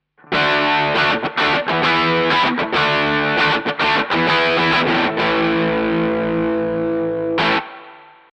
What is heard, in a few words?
Sanken CUX 100K Electric Guitar Crunch; RME UFX